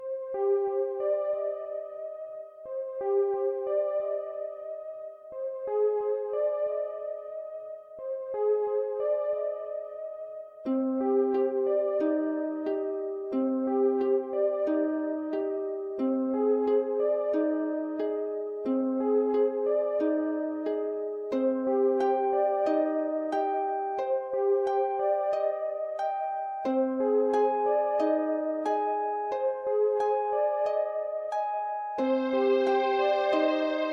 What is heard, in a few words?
cave intro, music